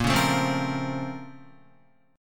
Bb Minor Major 9th, Guitar; BbmM9; strummed; 6,4,7,5,5,5; JGuitar

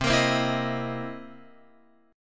C 7th Sharp 9th C Guitar Open F; strummed; JGuitar

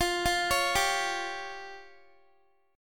F Suspended 2nd Sharp 5th F down Guitar; Fsus2#5; arpeggiated

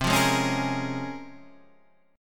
C sharp Major 13th, chord; C#M13/C strummed; JGuitar

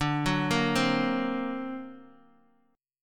D Augmented 7th D down Guitar Open D

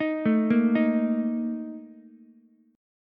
A sharp Major 7th Suspended 4th, Ukulele; arpeggiated; JGuitar

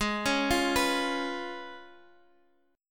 C sharp 7th G sharp

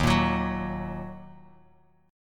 Eb Minor Bass Standard; Ebm strummed; JGuitar

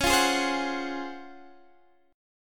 C sharp Major 11th C sharp, Guitar Standard; strummed; chord

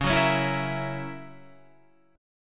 F sharp 7th, guitar

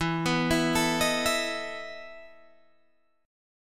E Major 7th Suspended 4th, Guitar, Open G

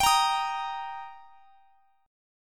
G Minor Sharp 5th G, chord; Gm#5 strummed; x,x,x,24,23,23

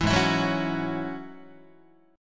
Ab 7th Suspended 4th Sharp 5th Ab down Guitar; Ab7sus4#5 strummed; 7,4,7,4,5,5

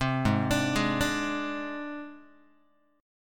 Ab Major Flat 5th Ab Guitar; AbMb5 arpeggiated; JGuitar

